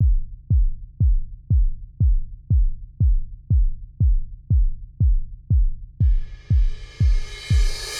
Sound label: drum